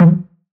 Temple Drum Vinyl, One Shots, Drums, Misc Percussion